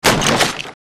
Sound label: Crates n Boxes, destructibles